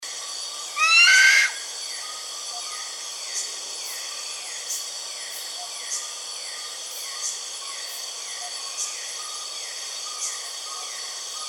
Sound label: buffy fish owl